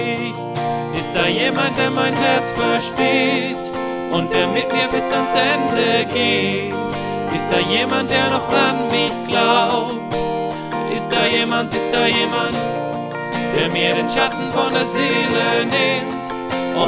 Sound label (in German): mit Backvocals